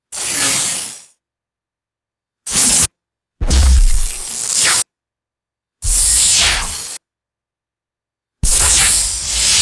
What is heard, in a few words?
quick synthetic electrical zaps and swooshes; electricity